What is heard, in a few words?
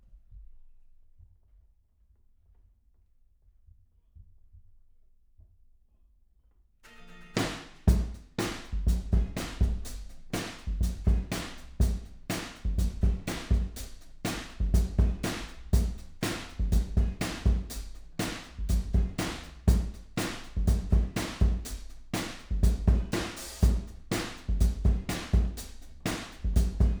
Room L